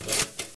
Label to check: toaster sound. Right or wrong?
Right